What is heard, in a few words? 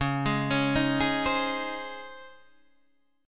C sharp Major 7th Suspended 2nd C sharp, Guitar Standard; C#M7sus2 arpeggiated; JGuitar